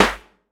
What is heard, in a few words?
fat steel snare drum sample g key, steel snare drum sound tuned to the G note; snare drum samples